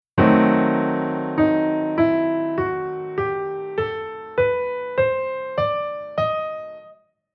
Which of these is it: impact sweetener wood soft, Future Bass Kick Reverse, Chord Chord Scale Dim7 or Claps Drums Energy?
Chord Chord Scale Dim7